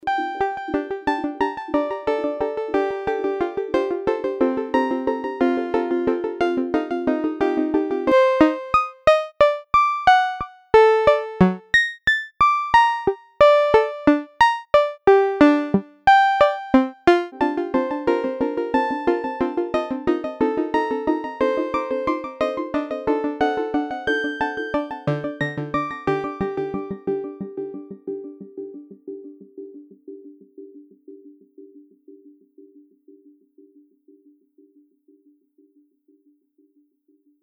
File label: iSEM Grassland Arp Delay FX